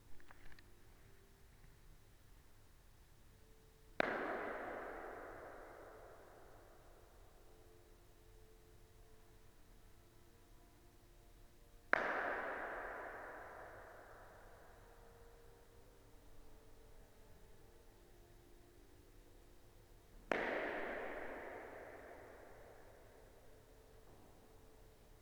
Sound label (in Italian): Palestra; Castellazzo; Isomec